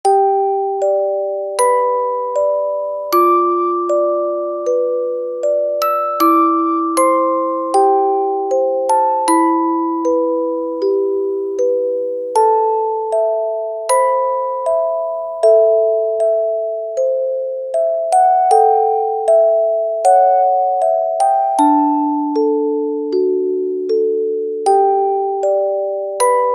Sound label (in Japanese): orgel